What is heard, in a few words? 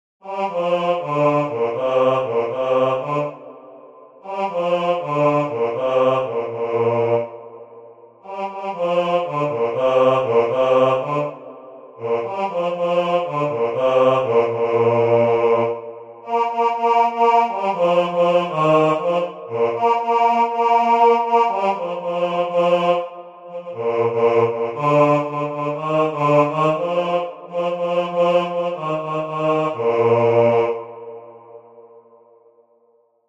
Bass, Midi; germersheim; Weihnachten